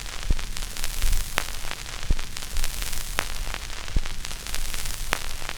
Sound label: CRACK; E-Lab; X Static Goldmine 2; akai